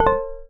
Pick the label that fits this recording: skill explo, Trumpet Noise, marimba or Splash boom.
marimba